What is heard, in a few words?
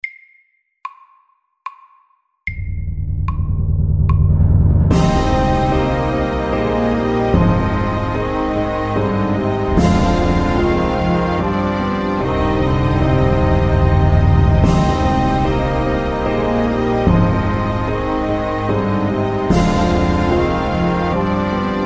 Filmmusikk MEDIUM 74